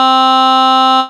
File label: AH Vox, Digital Synths; Ableton Foundation FreePacks